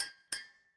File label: glass clink